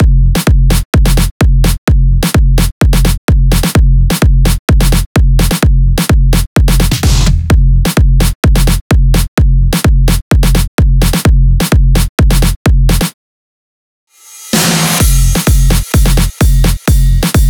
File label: 128BPM Full Drums; Vengeance; Essential Clubsounds Vol.5; Mainstage Kit; Root Fmin; Drums & Perc